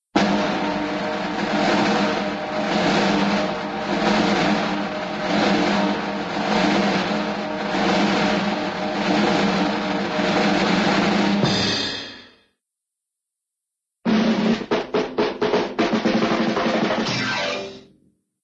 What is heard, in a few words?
drums acrobatic roll with accents trip and slide down; cartoon; hannah barbera cartoon sound fx